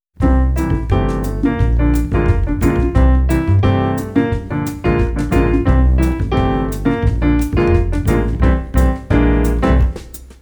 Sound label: PLAY ALONG, latin